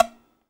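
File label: TZRS PIZ E 2, tzouras pizzicato; Soundscan vol.53; Mediteranean Traditions; AKAI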